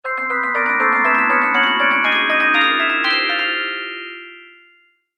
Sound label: Haunted Chimes, Scary Tone Sound Effects; horror